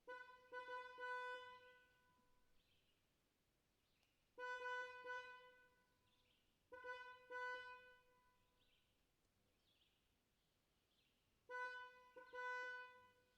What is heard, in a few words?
car horn distant with bird chirp